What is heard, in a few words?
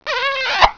KISS